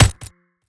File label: chain Gun Shot; Barotrauma; Weapons